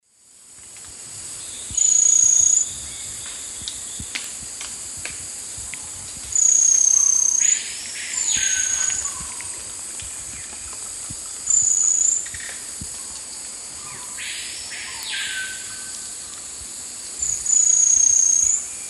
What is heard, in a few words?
Platyrinchus coronatus, songs 2 birds; S. America; Eastern Lowlands